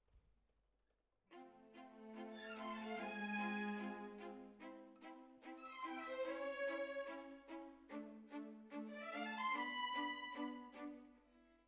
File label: Violins Over